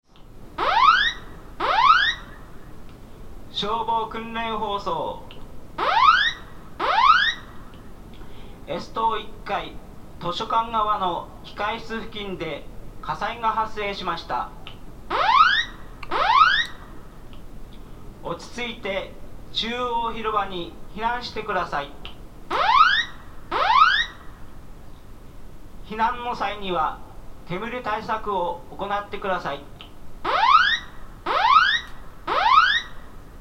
fire escape drill lab

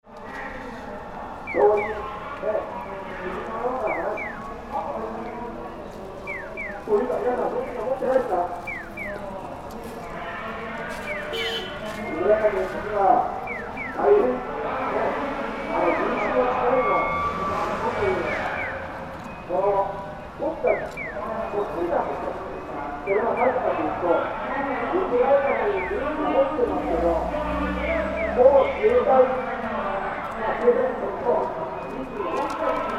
fukushima station road

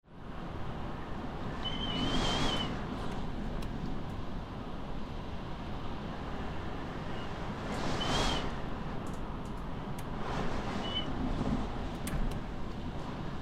kogarashi

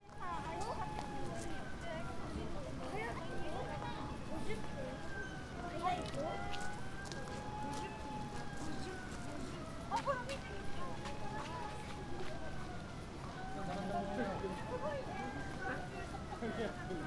village of 4 seasons jugle gim

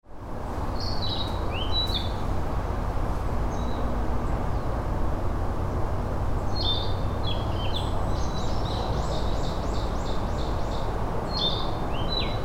kibitaki, soundscapes

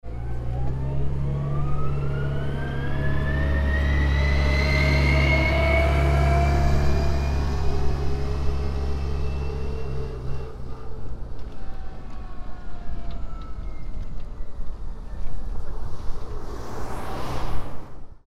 snowplow; kanayagawa

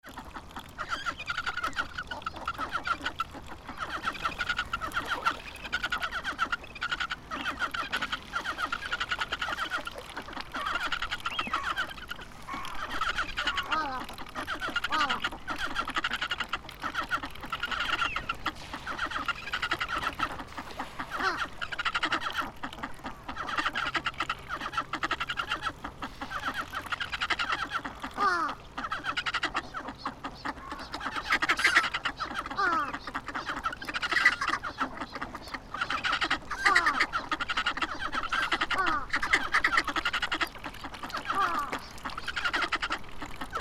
abukuma duck river; Abukuma River (near Kotori no Mori (forest for birds)); Many ducks came to this river; Fukushima Soundscape; Koji Nagahata

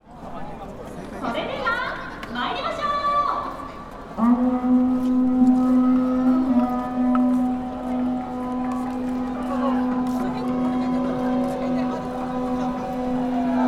waraji festival; machinaka

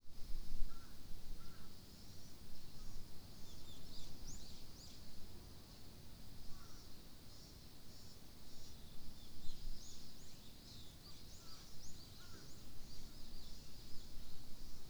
watari jr high

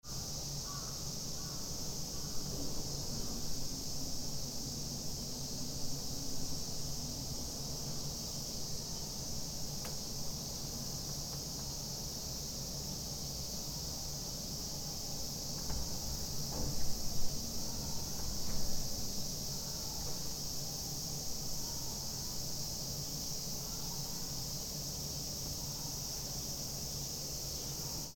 cicada

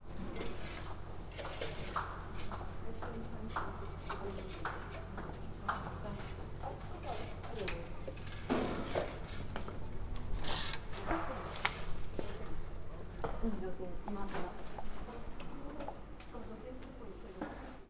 voices of passers-by

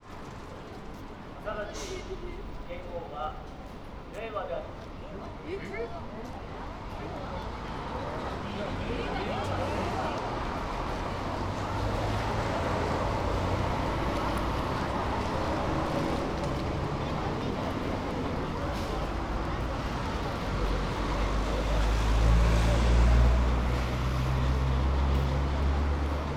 ekimae reiwa

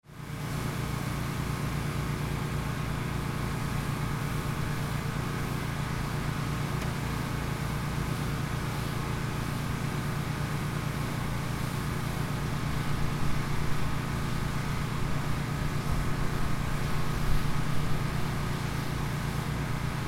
pool

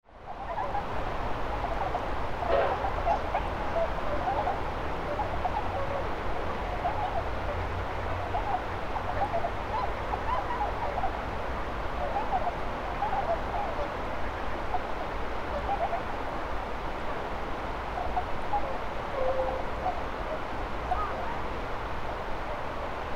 abukuma swan crow

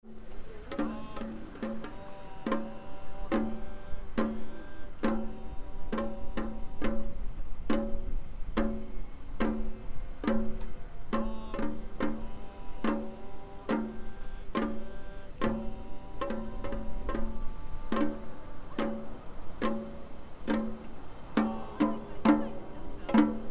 prefectural office

